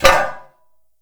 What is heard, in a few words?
Stone Cafe Table Impact; Warframe; Ambience; Nineteen Ninety Nine